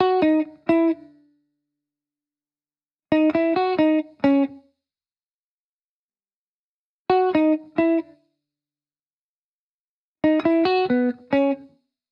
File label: Guitar Bpm135 Key B, 70s Guitars; Fox Samples - Back to the 70s Guitars